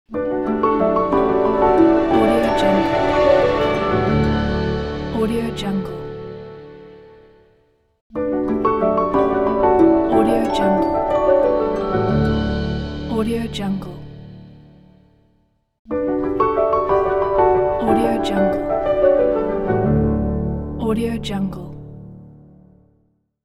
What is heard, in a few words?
royalty classical logo